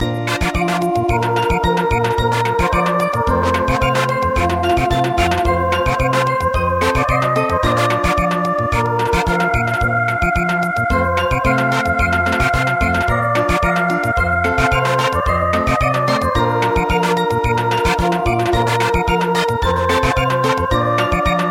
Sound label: Samba